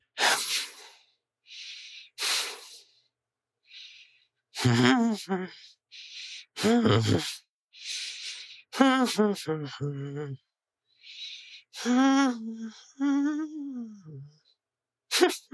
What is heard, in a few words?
inmate idle